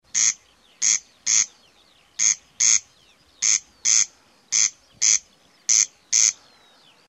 derkacz

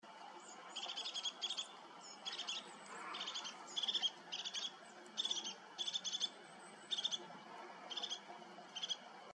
czubatka

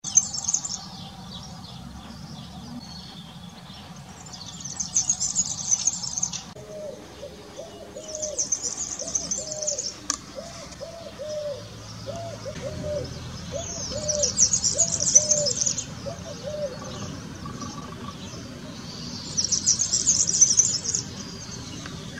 kulczyk

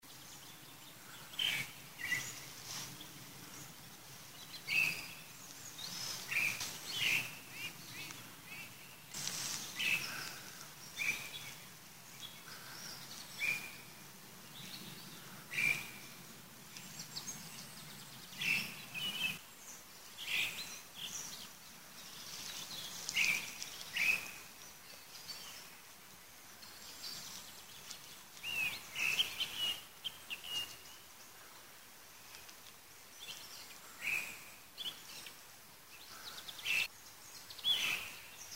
drozd obrozny, ptaki

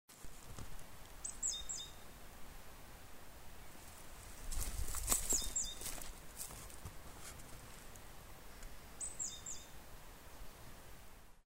sikora uboga, ptaki

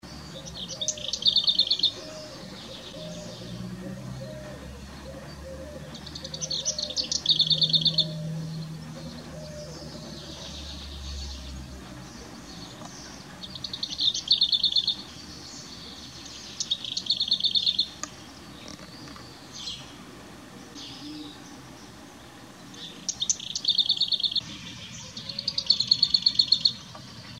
piegża, ptaki

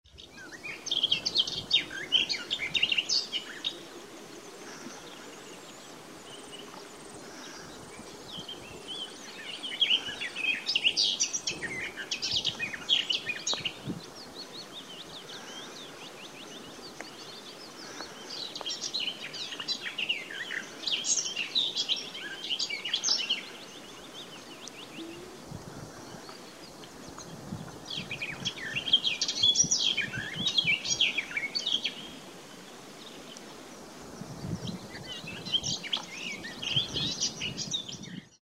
gajowka, ptaki

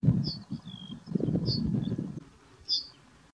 trznadel; ptaki; odszumiony

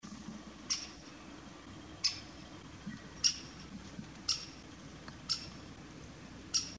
dzieciol duzy, ptaki

